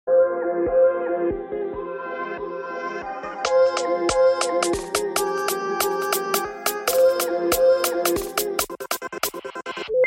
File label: music